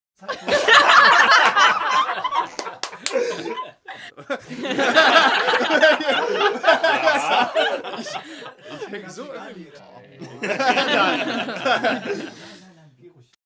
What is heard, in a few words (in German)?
outtake lachen